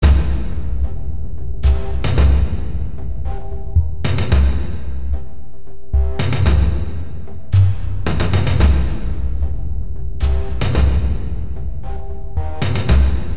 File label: Siege Assault Arp Drums; Revulsion